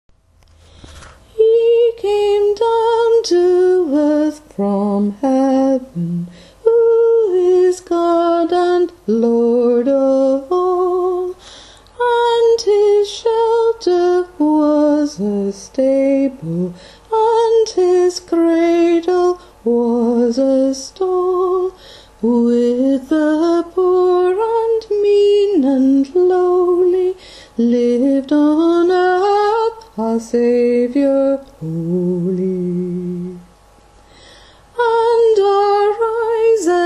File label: bass voice; once in royal davids city